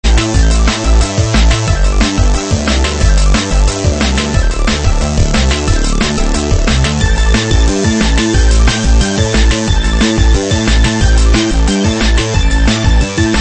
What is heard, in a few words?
8bit mix; Last Ninja Wilderness